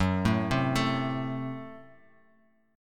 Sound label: Gb Diminished Bass Standard; arpeggiated; JGuitar